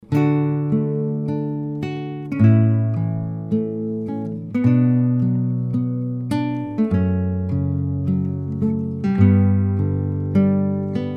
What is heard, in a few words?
Canon in D